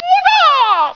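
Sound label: flying kick; mk2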